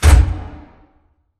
sliding doors open start; beacon; Titanfall 2

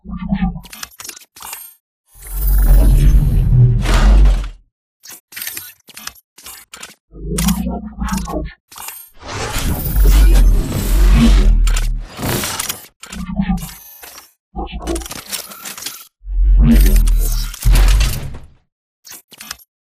Casual Idle; Titanfall 2

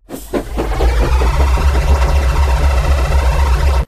vertical fan switch startup close; beacon; Titanfall 2

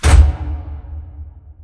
sliding door lg verb open start; beacon; Titanfall 2

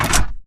Mvmt FS Walk Default; AI Stalker; Titanfall 2

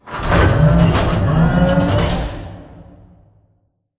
Robot Arm Pass Left Deliver Retract Close; Boomtown; Titanfall 2

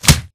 bullet light ballistic flesh; Titanfall 2

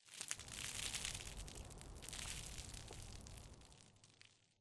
Rock Debris Small Short; Boomtown; Titanfall 2

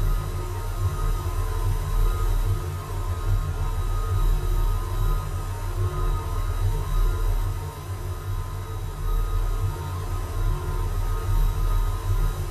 amb airbase holomap; Titanfall 2

